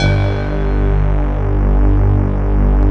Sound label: D50 Stak, Roland; 90s Sample CDs; Club-50 - Foundations Roland; D50 Syns